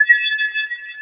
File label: bonus